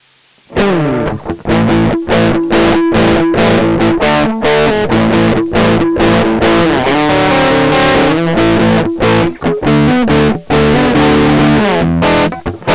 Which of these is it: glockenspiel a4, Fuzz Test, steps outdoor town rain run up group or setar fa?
Fuzz Test